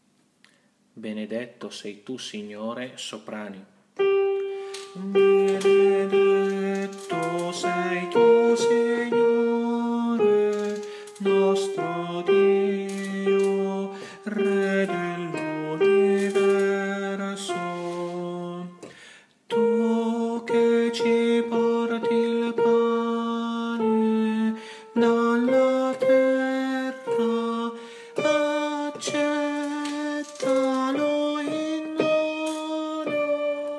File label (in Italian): Soprani; Benedetto Sei Tu Signore